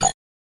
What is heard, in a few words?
computer error; ion sound